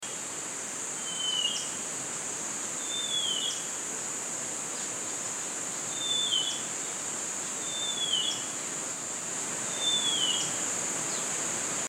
kehicap tanahjampea

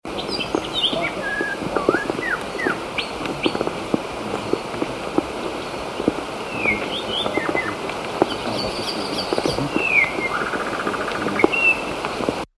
suara kicauan kacer lutung niger

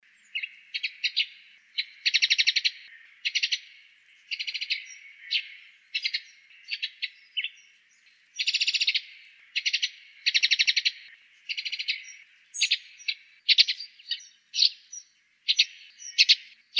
gereja erasia

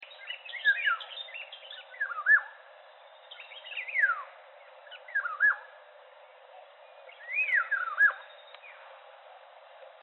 suara burung kepodang

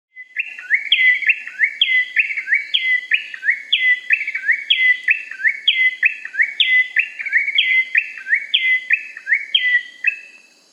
suara cinenen merah

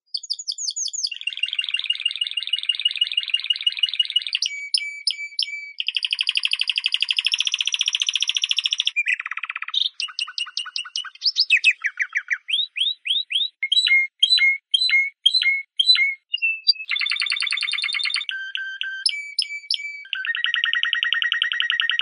burung kenari spanish timbrado

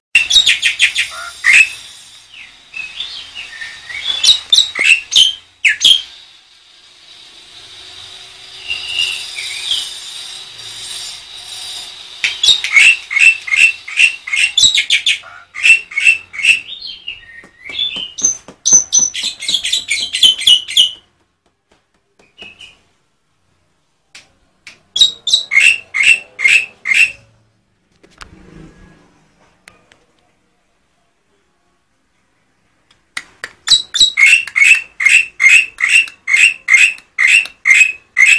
jalak putih

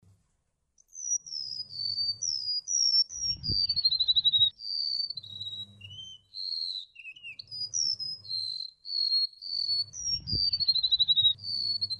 suara remetuk laut

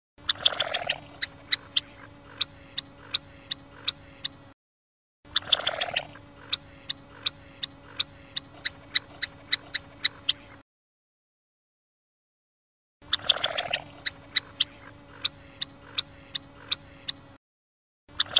suara burung red-necked phalarope betina

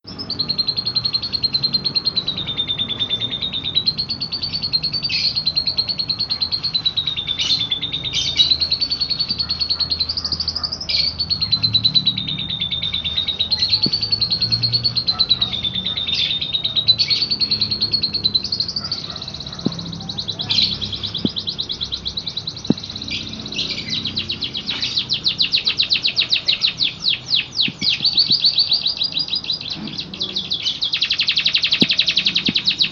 kenari isian lovebird

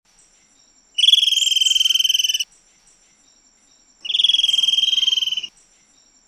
burung sapayoa masteran

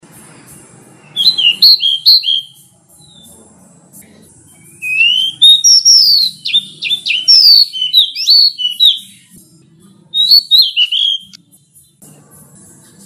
suara decu belang

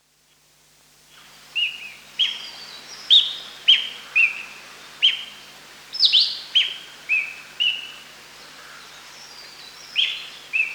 suara burung cissa thalassina ekek keling

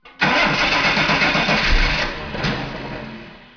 suara mobil

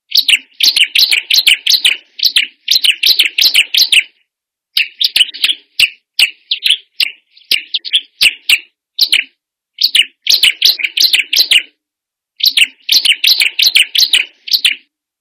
ciblekkebun

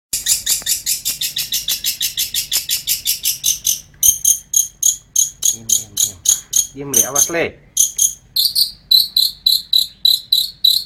suara burung cendet atau pentet dengan ragam variasi lagu dan gaya

